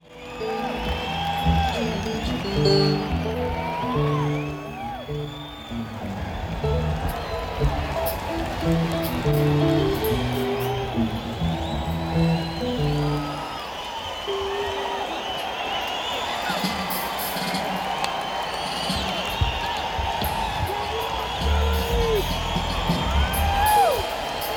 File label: Crowd Tuning; neumann u87